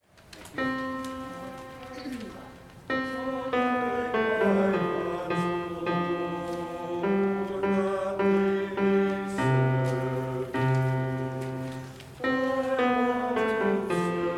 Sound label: bass